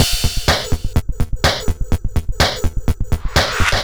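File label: 125 bpm no bass drum, drum loops; Zero-G; Soundclash Nu Dance Electro; Acidized Wavs; Krakenhausen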